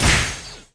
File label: Player Pain Damage; Disney Star Smash; Android, iOS, Mobile